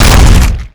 charger smash; zombie plague

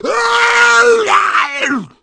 zombie infect

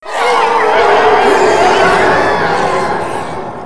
zombie win; cstrike; zombie gero edition

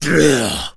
zombie attack, claw attack; zombie plague

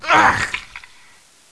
Pain Female

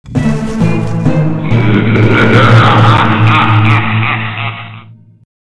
win nemesis; zombie plague

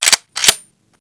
k5 slide pull, weapons

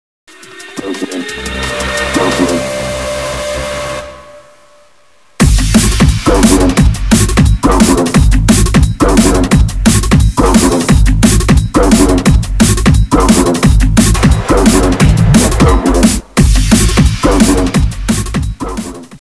zm dnb

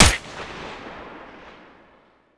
aug, weapons

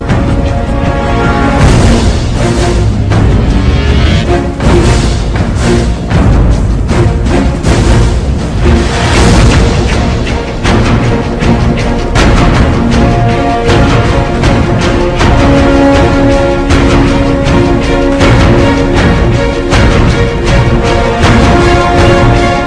music zombie; zombie plague

